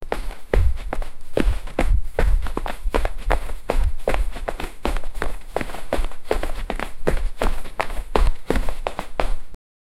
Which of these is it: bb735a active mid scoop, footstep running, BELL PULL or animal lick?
footstep running